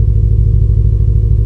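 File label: engine exhale, advanced engine sounds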